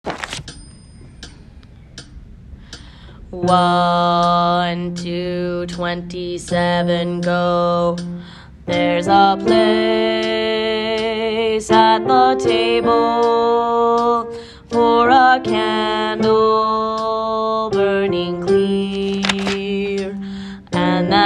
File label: bass